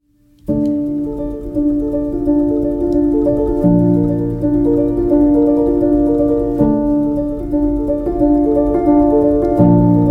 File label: Arpeggio in D Minor